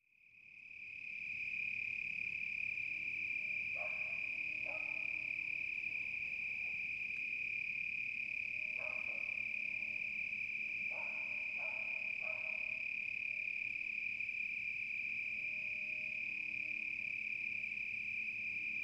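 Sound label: Multi Crickets Medium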